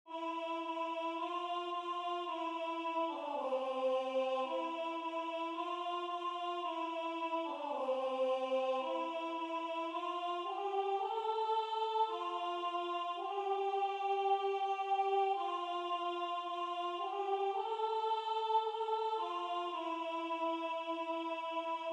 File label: Alto